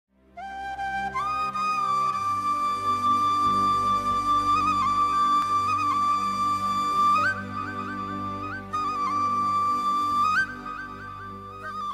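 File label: sad background music; sushant sing; chichore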